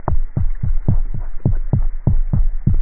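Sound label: fish sound possible toadfish variant; haddock like; fish grunts (thumps)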